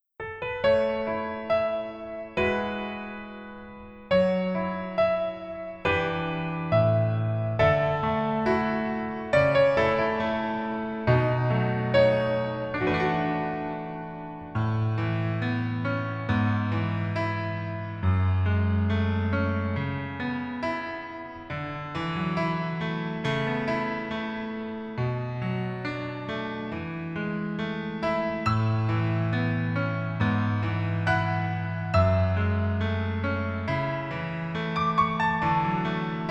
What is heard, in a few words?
Piano ver